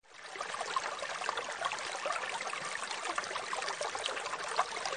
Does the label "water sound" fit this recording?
Yes